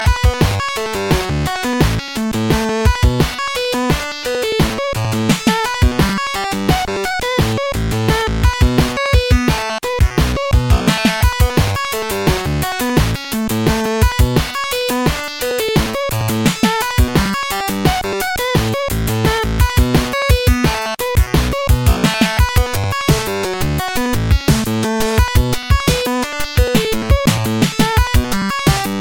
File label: 8bit Sparks